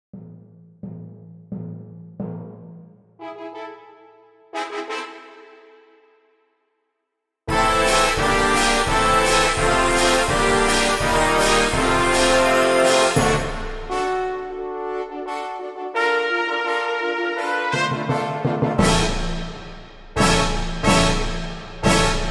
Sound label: brass